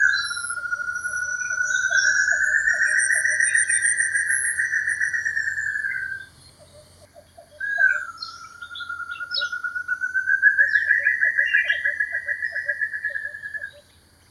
Banded Broadbill calls, Kledang Sayong Forest Reserve Ipoh Perak Malaysia